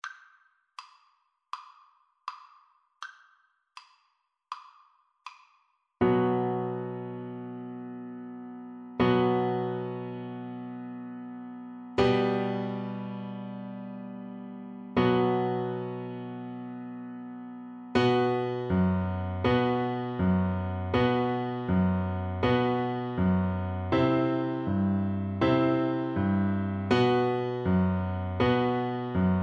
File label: hava nagila Oboe